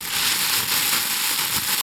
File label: white noise